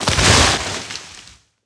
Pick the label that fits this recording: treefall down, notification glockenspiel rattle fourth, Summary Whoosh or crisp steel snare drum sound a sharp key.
treefall down